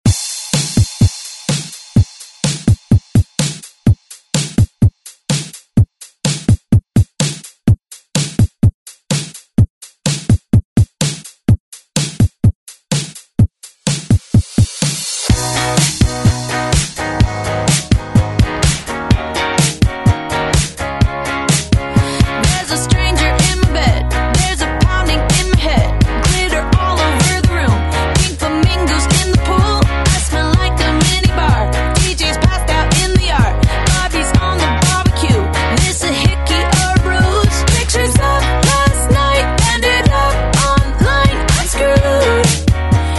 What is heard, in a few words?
ReDrum; DJ Mhark